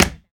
Perc Snap Tap, One Shots, Drums, Misc Percussion

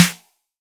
Snare; Ableton Live 12 Lite Core Library

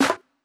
Snare, drums; Ableton Live 12 Lite Core Library

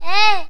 Vocal Baby Hey; Ableton Live 12 Lite; Core Library

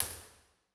Early Reflections Synagogue Wide R; Impulse Responses; Ableton Live 12 Lite

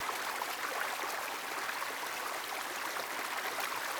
river small; background

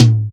Tom SP; Drums

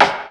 Rim; Drums; Ableton Live 12 Lite Core Library

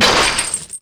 chain break; Gish

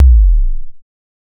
Kick Sine

